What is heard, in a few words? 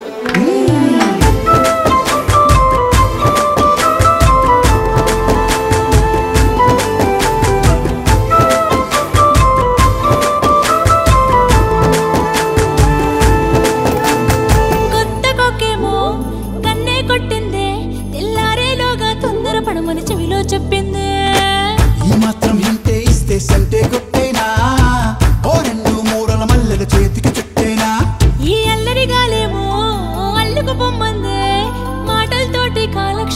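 background music ringtone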